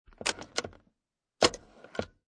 Car Interior Engage Disengage E Break, Car Interior, 1996 Acura Integra, Engage And Disengage Emergency Break, No Engine; Transportation Automobiles